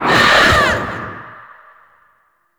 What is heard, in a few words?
Hysterical Assault; Warframe; Warframes; Berserker